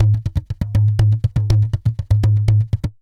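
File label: tantango groove; Best Service; World Colours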